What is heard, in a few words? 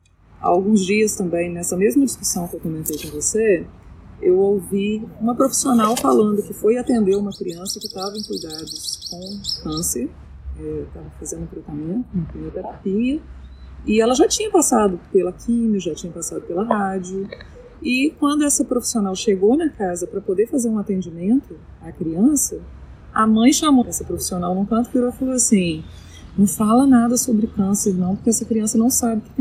vocals